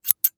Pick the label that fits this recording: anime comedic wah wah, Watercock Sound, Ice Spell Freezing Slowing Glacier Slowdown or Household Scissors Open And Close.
Household Scissors Open And Close